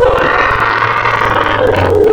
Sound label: Vulture, Wavetables; Cymatics; Serum; Animals for Serum - Gold Edition